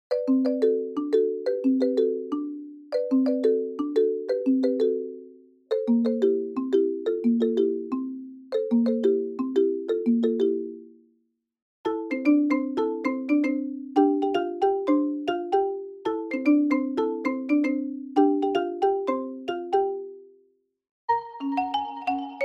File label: Smartphone Ringtone